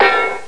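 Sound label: BUMP HEAVY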